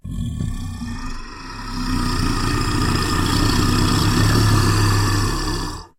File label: ogre; minecraft; technic